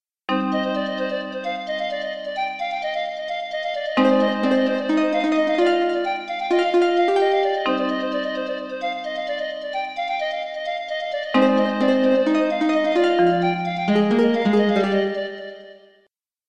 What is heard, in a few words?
motorola moonlite haze, ringtone